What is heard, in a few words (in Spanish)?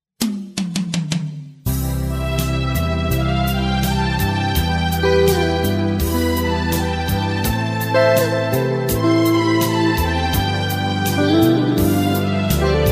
instrumental